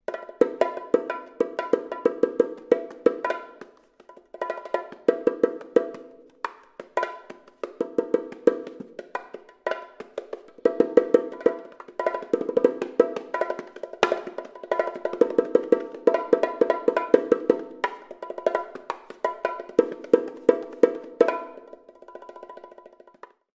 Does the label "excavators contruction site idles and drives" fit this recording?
No